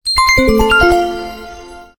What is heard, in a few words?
Splash; ZTE; Z992-Avail-2